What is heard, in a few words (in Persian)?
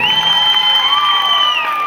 tashvigh jamiat